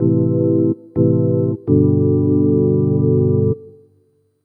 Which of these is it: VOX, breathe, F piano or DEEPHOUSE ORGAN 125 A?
DEEPHOUSE ORGAN 125 A